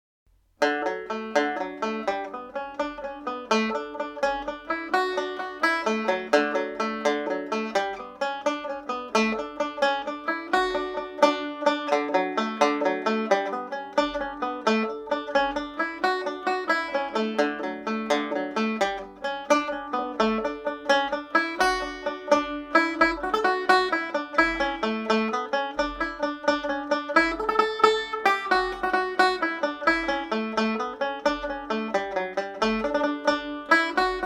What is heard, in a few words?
jig, banjo; flanagans